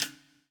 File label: PWR RIM